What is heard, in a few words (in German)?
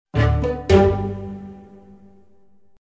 Soundlogo